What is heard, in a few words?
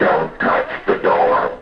door, pinball; twilight zone pinball; Dont Touch The Door; Lawlor